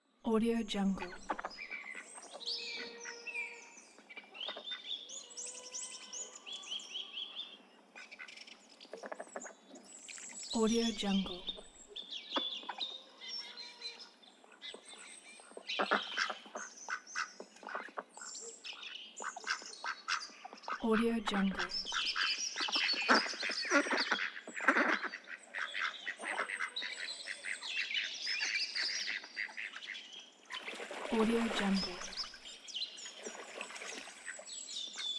birds in nature

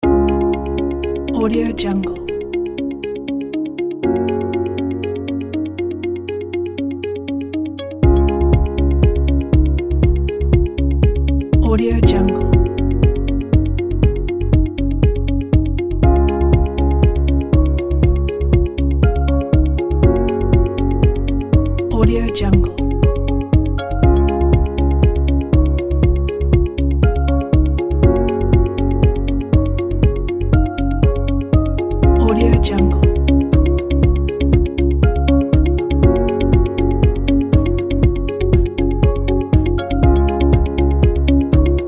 background corporate

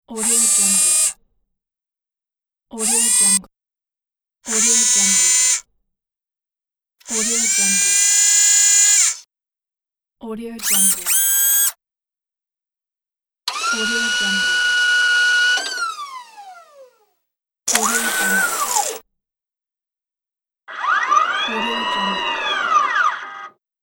robot sounds

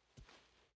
dragon footsteps

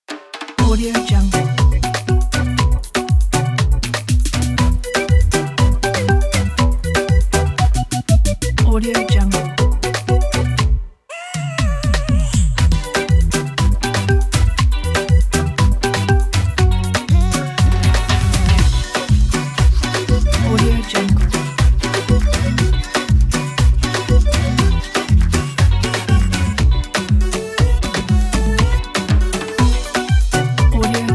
the modern afrobeat

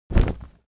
foley step monster step heavy

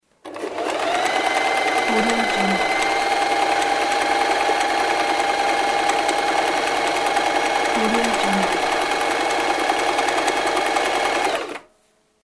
electric sewing machine

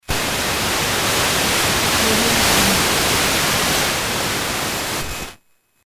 analog interference

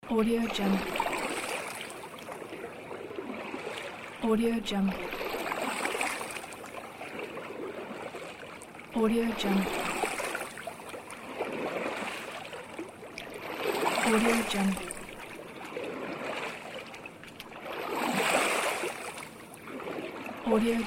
waves

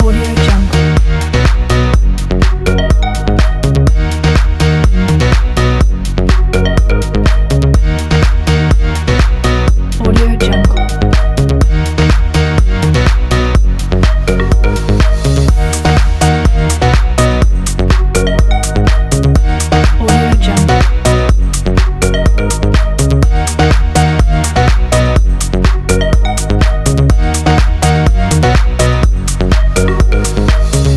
house loop

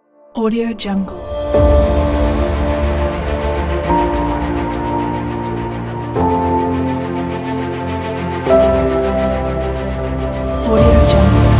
epic; eliansproductions

